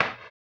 HAMBONE, Vocal Blues Soul; Spectrasonics; Planet

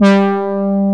HO G3, ANA HRN

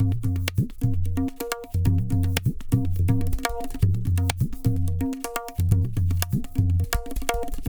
Tabla, 125 BPM; Zero-G; Pure Tabla

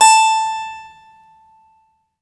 HARPSI A5; HPSCHD 8

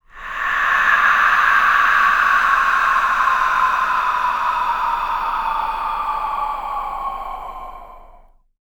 A O SWEEP, VOCAL WIND; ILIO; Vocal Planet; Jazz & FX

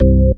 DM ORG1, Dance Mega Synth

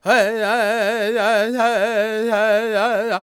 C# MIN, World Voices, LAKOTA

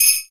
SLEIGH, Ensoniq ZR-76; 300 Drum Machines

